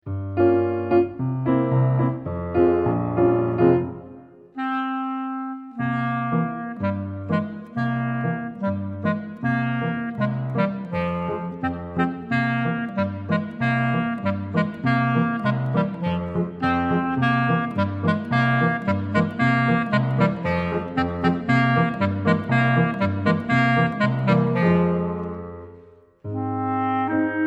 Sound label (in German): klarinette klavier; kalinka